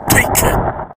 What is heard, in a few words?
Meteo reverse